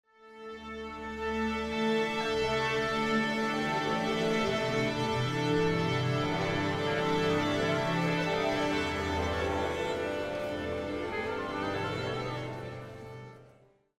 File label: Orchestra Tuning Ambience - Short Symphonic - Musical; Hollywood Edge; sound designer's toolkit v2